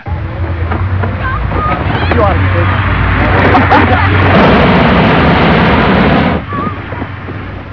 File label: noise